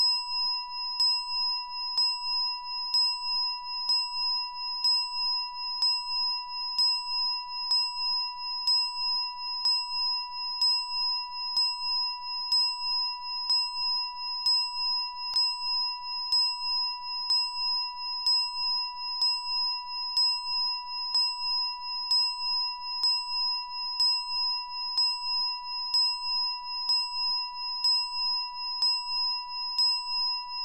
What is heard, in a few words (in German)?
Klangschale